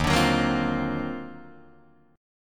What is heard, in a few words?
Eb Minor Major 13th, Guitar, DADGAD; EbmM13; strummed; JGuitar